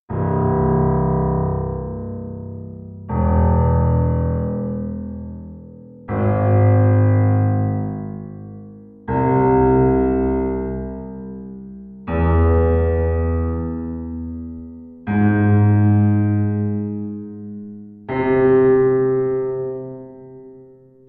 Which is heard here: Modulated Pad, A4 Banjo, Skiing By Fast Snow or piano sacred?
piano sacred